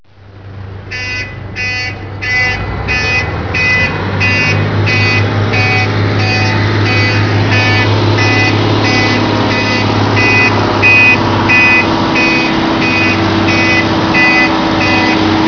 Truck Backing Up; iLinux

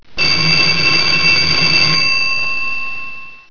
Phone Ring Old; iLinux

